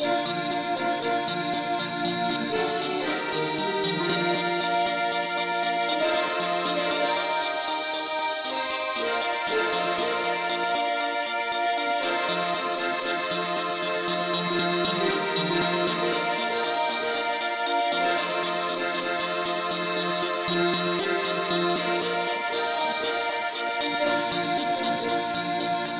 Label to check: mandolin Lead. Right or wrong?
Right